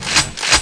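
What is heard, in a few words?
shotgun fire; quake2; rampage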